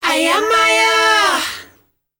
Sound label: KIDS, S. AFRICAN, World Voices; ILIO; Vocal Planet